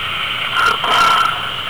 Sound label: gorilla